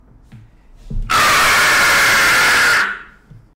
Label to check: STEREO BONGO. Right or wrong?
Wrong